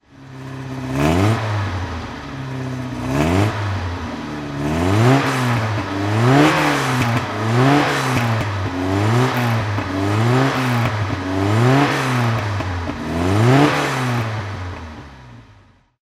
alfa romeo giulia 1600 rally throttle

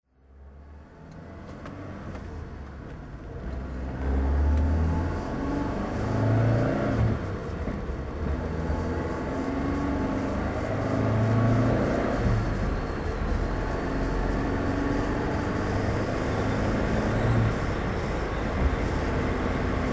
alfa romeo 2000gtv driving

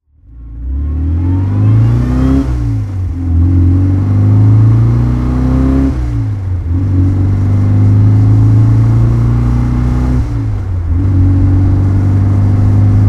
austin healey 100 4 side driving

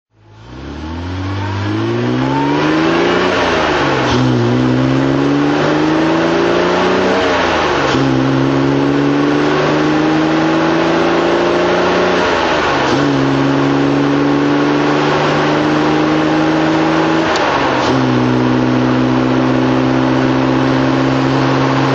austin healey 1006 sidepipe drive

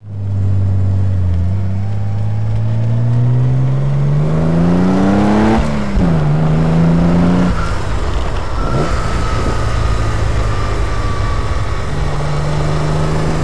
tr6 pi drive

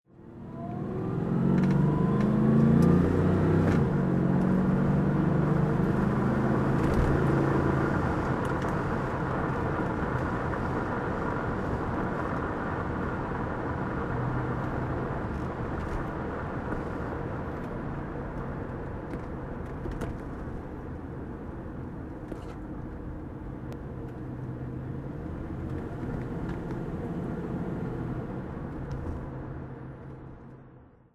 jaguar xjs V12 coupe driving

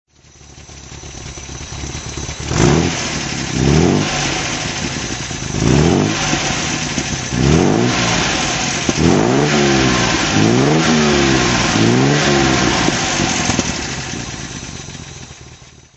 throt, Austin Healey 3000 Mk III; phase 1, 1966; Engine sound; V3D/ ClassicarImages, Marc Vorgers

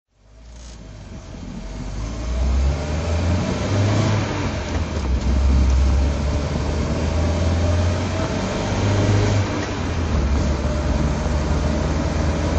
volvo p1800es drive

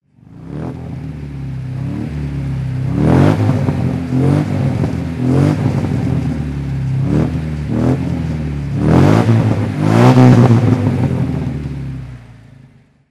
healey 1004 creme brown throttle

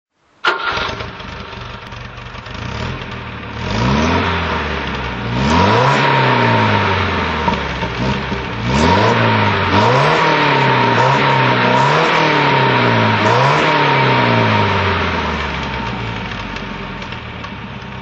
volvo pv544 throttle

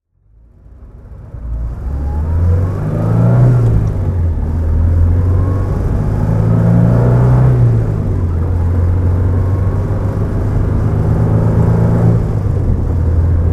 alfa giulietta 1300 sprint driving

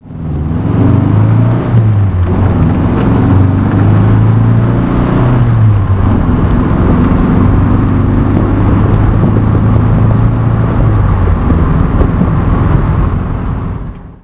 tr4a drive

